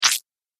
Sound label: Laser Squelch, Laser Sound Sound Effects